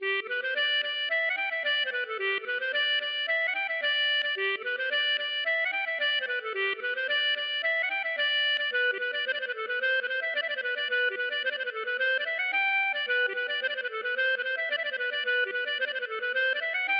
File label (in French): bourree Carree; De La Chatre